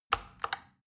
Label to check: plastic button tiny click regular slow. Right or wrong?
Right